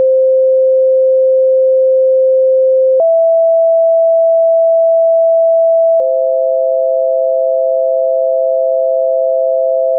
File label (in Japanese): ド-ミ(平均律純音); 実際に平均律と純正律の音の違いを体験してみましょう。; classical music